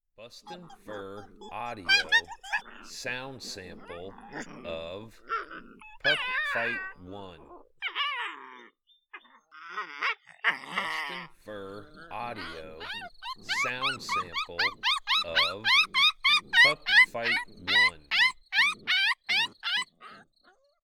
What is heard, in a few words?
Pup Fight